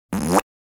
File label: Human funny raspberry